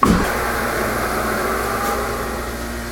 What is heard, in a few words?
motor start hydraulic, elevator